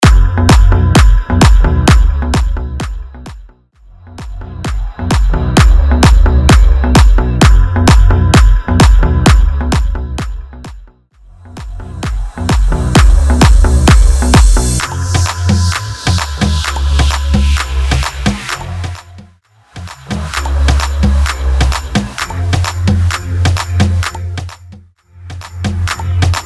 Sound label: Guaracha Beat 130 BPM